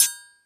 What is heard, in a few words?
FX Sounds, Drum Machines E-MU Pro-Cussion